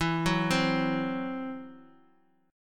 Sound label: E Suspended 2nd Sharp 5th E, down; Esus2#5 arpeggiated